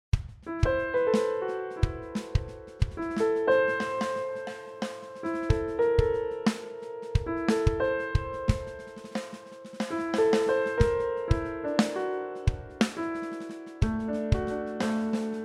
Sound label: Improvist